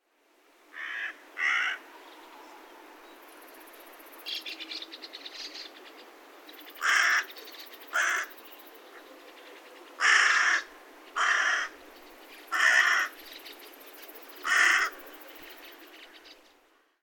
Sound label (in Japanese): ハシボソガラス 幼鳥